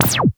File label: Pew; pimoroni-pico; cosmic unicorn